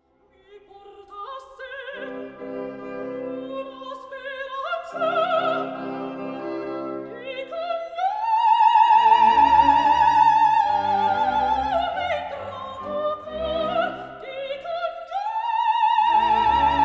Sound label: Dove sono Shrieking